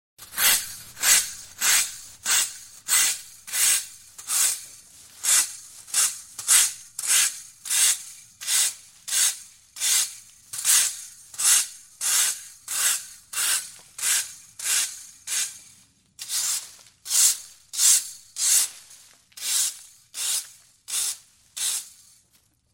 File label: Raking leaves on balcktop; SOUND EFFECTS1